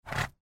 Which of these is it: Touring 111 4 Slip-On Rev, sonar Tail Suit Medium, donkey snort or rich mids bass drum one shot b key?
donkey snort